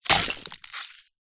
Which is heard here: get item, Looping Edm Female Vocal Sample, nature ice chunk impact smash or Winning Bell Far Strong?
nature ice chunk impact smash